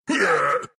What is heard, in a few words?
pain, ru; dota2